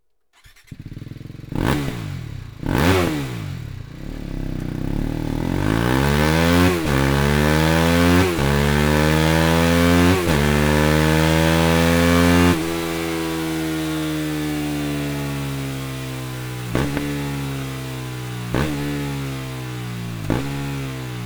racing exhaust system bmw g310 r; akrapovic

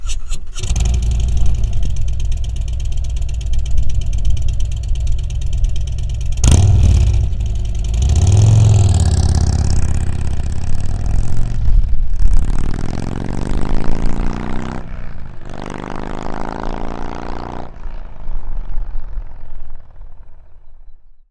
Touring 107 Slimine 4.5 Take Off

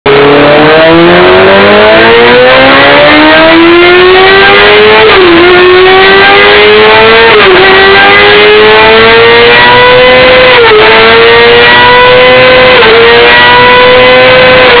oval evoii slip on exhaust honda cbr600 rr; leo vince

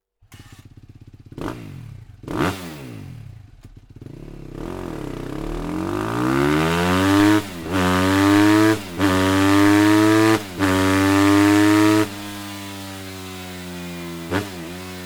akrapovic evolution exhaust system suzuki rmz 250; 2010-2017

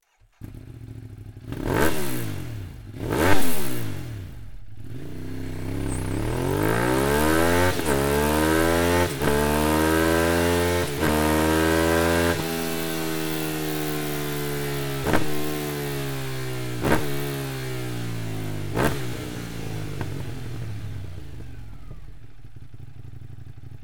slip on exhaust ducati monster 1200 s; akrapovic

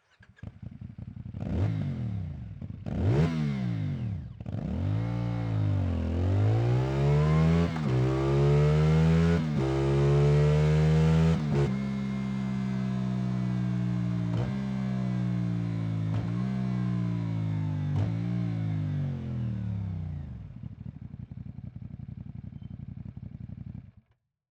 STOCK EXHAUST CFMOTO 450SR NK; 2024-2025; motorcycle; akrapovic slip-on exhausts

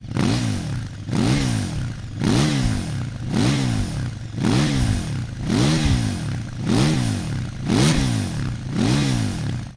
hyper cone slip on exhaust ktm 1290 super duke r; remus

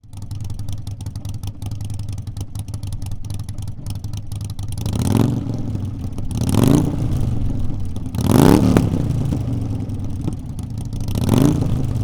Sportser 1200 3 Slip-Ons Rev

